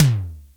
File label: DRM SIMM, Analog Drums; Roland L-CD701